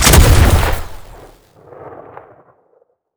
rifle, combat weapons; CosmicRage; CosmicRageSounds

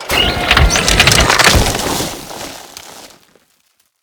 ice unload

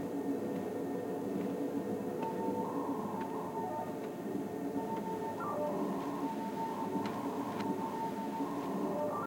operations center, ambiances; CosmicRage

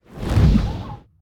fire; CosmicRage; combat; bigtail

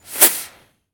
rocketaim, weapons; combat

